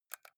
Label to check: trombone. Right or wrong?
Wrong